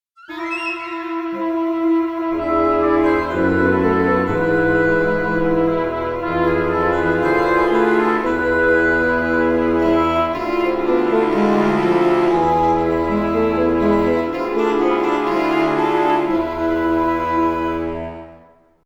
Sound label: orchestra wood